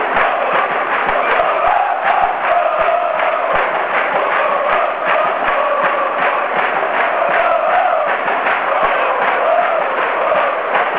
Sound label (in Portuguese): fogo